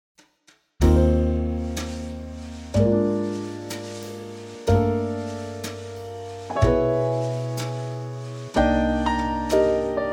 Eb Trio; key - Eb - vocal range - C to F (keeps more to the upper range so more suitable for high male ranges); A gorgeous Trio arrangement

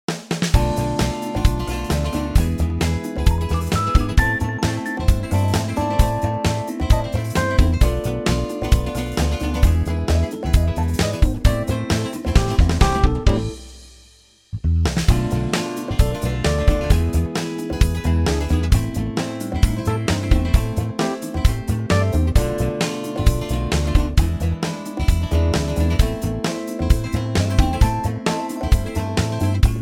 smooth C

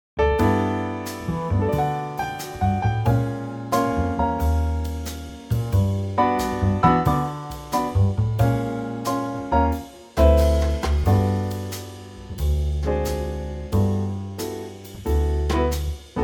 G Trio

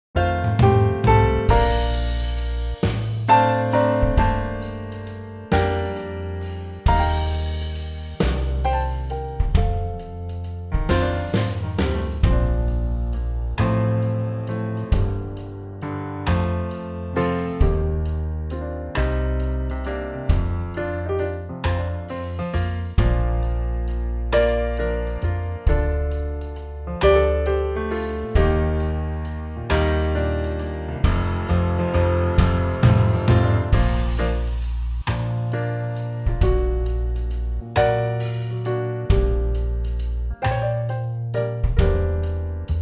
Trio Bb; key - Bb - vocal range - Bb to D

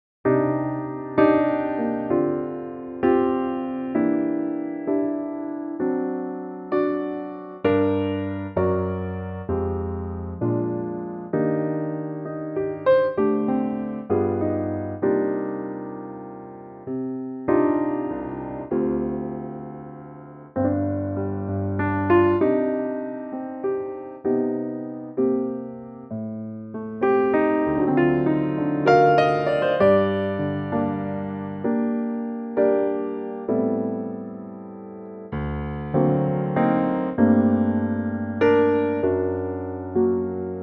Eb piano; key - Cm/Eb - vocal range - D to Eb

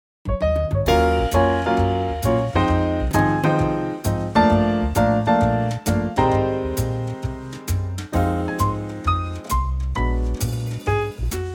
Db trio; key - Db - vocal range - Db to D

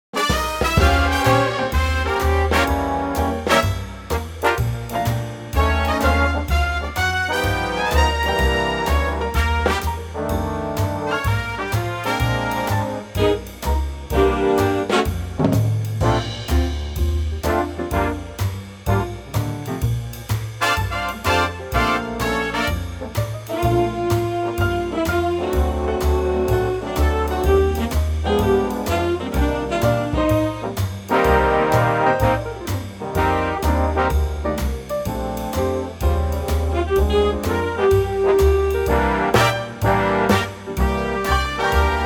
Bb Big Band

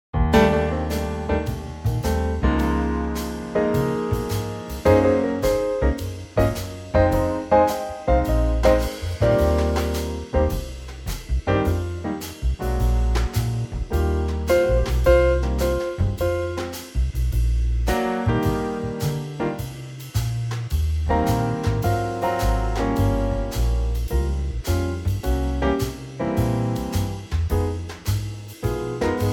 trio Eb

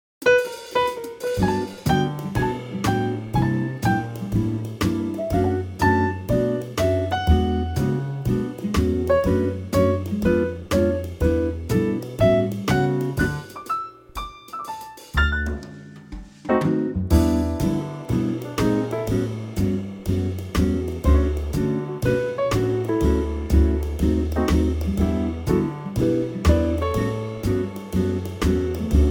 Quartet; key - D

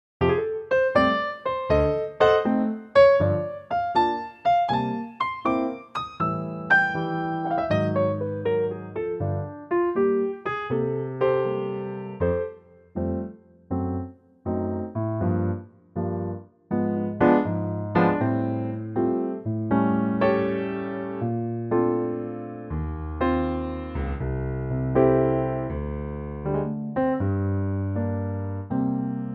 F piano; Pianotrak; key - F; range - F to G (optional A for the bluesy end!); Lightly swinging piano only arrangement

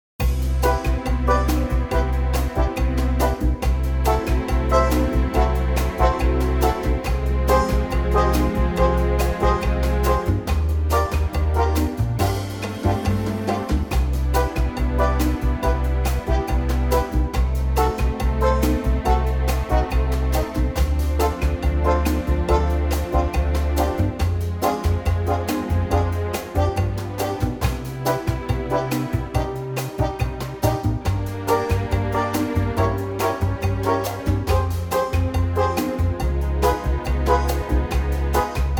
C Bossa; key - C - vocal range - A to C; Wonderful orchestral Bossa arrangement of the classic standard

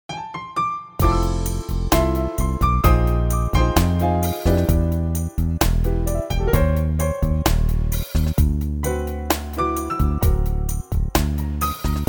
C smooth

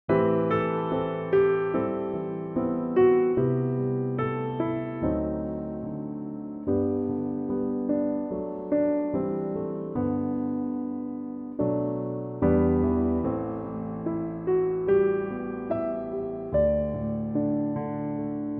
G piano; key - G; Pianotrak; Very lovely piano only arrangement

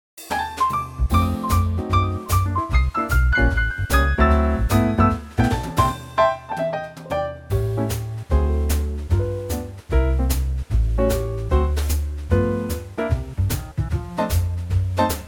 C to Db No Guitar; no solo; key - C to Db - vocal range - C to Db; Fantastic Quartet arrangement of the old jazz classic.

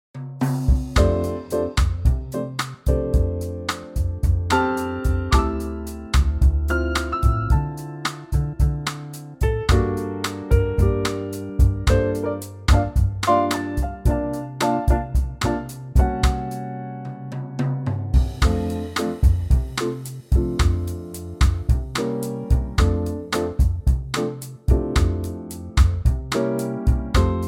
F G bossa; key - F to G - vocal range - C to B (optional D)

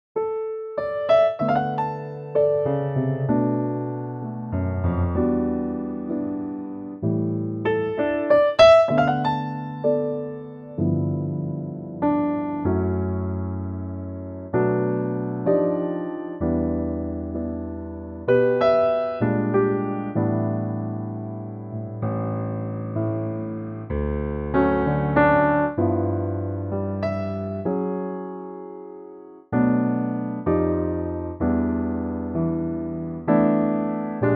D piano

